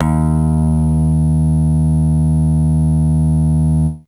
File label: d#2, FAIRLIGHT Electric Bass; Retro Sampler